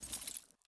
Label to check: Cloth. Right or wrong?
Right